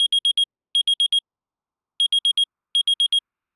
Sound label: alarm, alarms; freetel